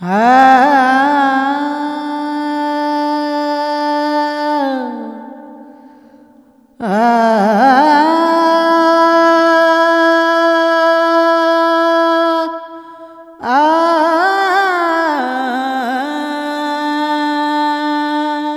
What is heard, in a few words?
Vocals Male, World Pop; Sonic Foundry (Sony Creative Software)